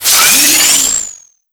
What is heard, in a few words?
mine betty spin; Explosions